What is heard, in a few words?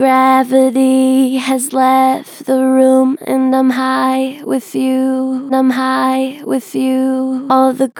Gen Vib Gravity has left the room, Vocals, Natural Vibrato Gentle; Harmor Vocals